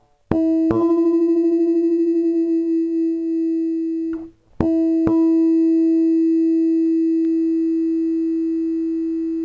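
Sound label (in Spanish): afinación, Guitarra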